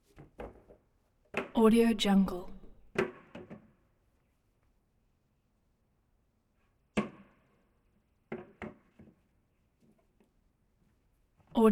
packing wagon distant; collanderson

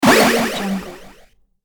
powerup score

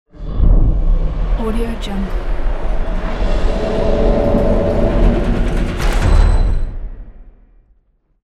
huge gate; infinity-sfx-productions

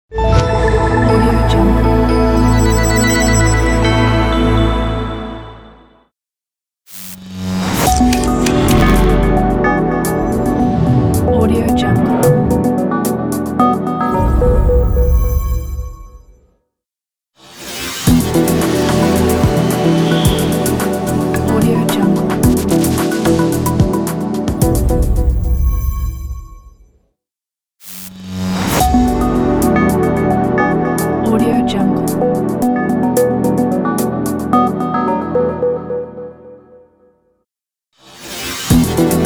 hitech logo pack; wavbrulee

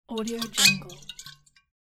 bottle open and slosh

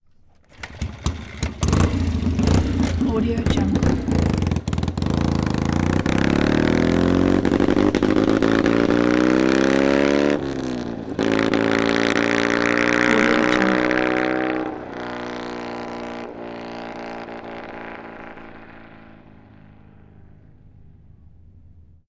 bsa wasp competition motorcycle start; 1965; prosoundfx

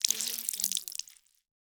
fleshy blood spill

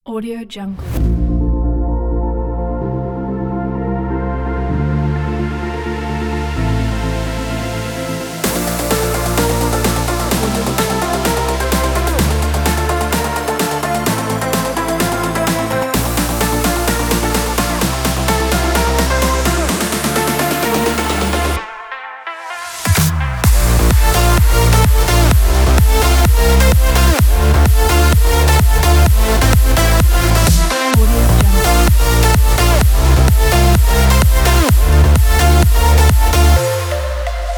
edm

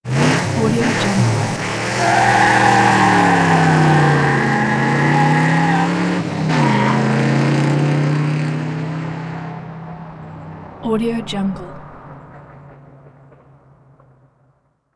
sport car revs and squeals tires

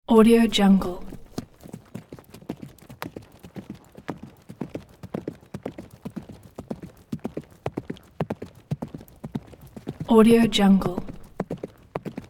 horse ride while galloping on soft surface; sound ideas

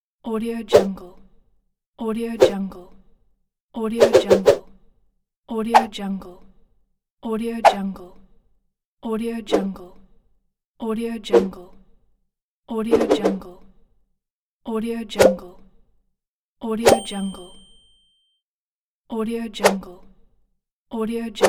wooshes; evdokim-music

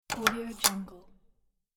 click

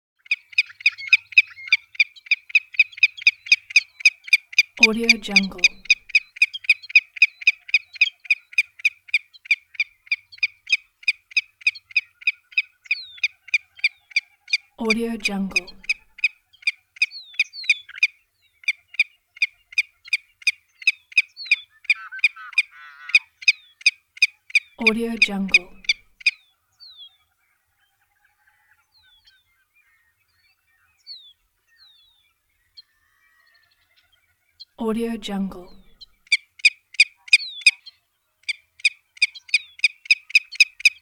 willet bird chirps; hollywoodedge

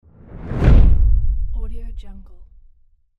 cinematic whoosh impact sound

